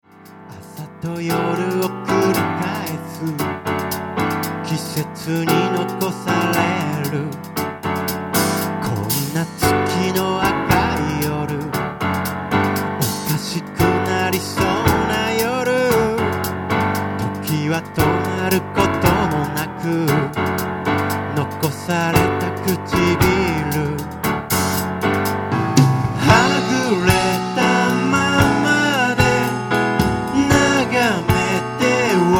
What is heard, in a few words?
melody